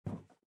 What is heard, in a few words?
run wood; Out of Reach: Treasure Royale